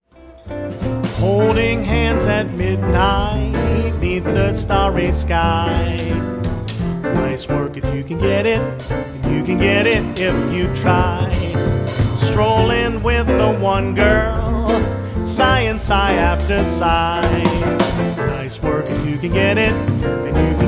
Jazz Band; Pittsburgh

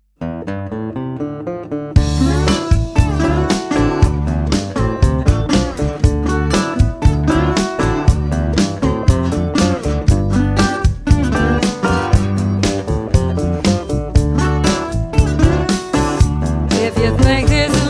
Country Singer

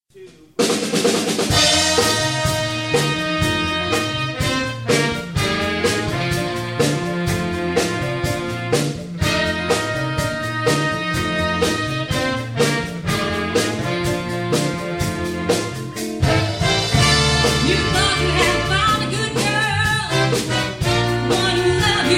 Wedding Band; New Orleans

